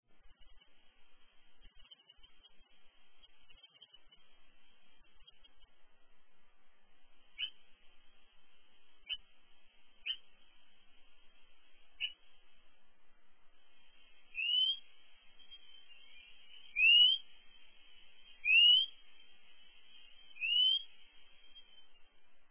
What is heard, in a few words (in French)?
rouge gorge, chants et cris